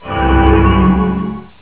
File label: item steel skin; Warcraft 3